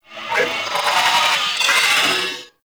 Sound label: Possess Scream; Enemies; Purgatory Warrior; Barks; Warframe